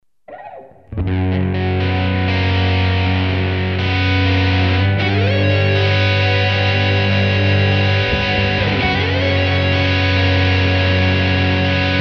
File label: cerebral guitars